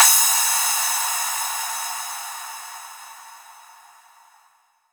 Crash, Cymbals

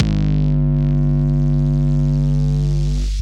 TURBO 808, Drums; Distored Reality 1&2

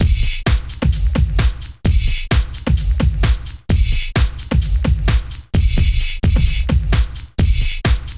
rock; INIS

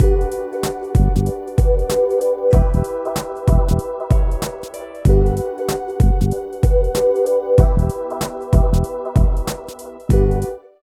75 LOOP; East West; Phat & Phunky

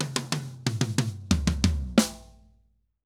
Drums Poly

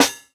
Snare, jungle breaks drum sounds

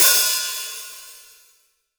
HH, STUDIO STEREO HI HAT

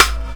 Dance Mega Drums, KIT D+B; Best Service

